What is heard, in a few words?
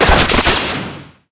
Gun Scale, Damage